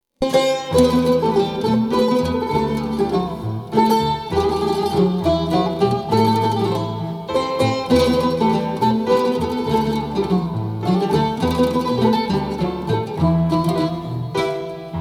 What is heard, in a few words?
instrumental, persian